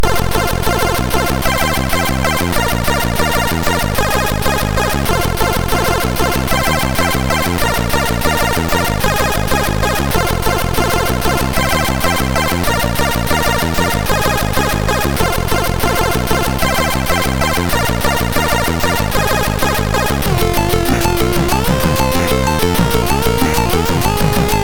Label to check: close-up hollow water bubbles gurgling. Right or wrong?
Wrong